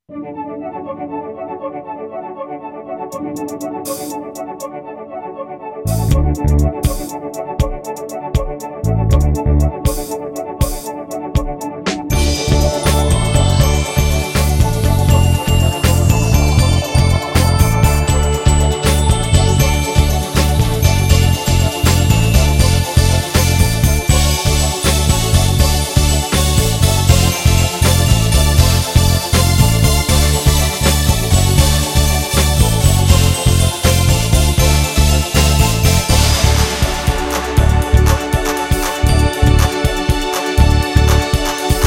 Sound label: Upbeat; Grilanthus